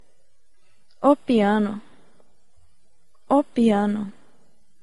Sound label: piano